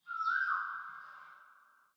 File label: ambient tropics birds